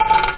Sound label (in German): Wecker; Amiga 8-bit Sampled Voice